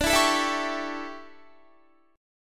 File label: D Major 7th Suspended 2nd Suspended 4th D down Guitar 4E 4A 5D 5G 5C; DM7sus2sus4 strummed